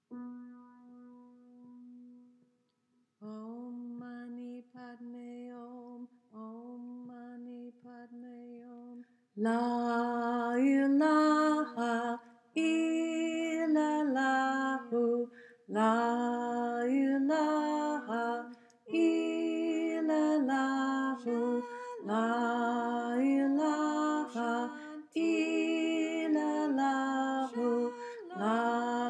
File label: Chant Tenor, choir